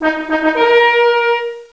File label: message